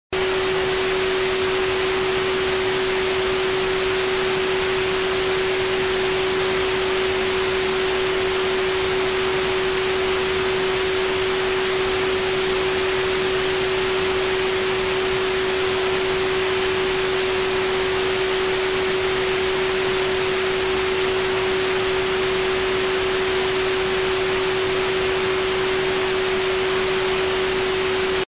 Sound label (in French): bruit blanc